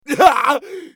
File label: bear death; eft